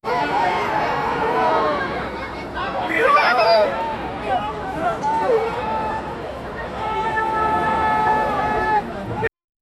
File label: a lot of people at, carnival